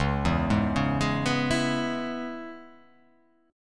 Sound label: Db Minor 7th Db down, Dbm7 chord; arpeggiated; JGuitar